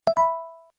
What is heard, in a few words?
crisp